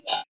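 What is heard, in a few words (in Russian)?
всасывание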